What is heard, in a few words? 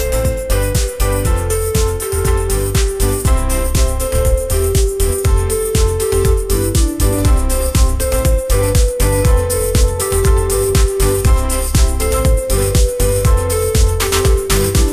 upbeat pop melody; ai